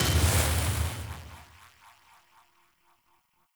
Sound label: Bird Power Burst; Powers; Soul Steal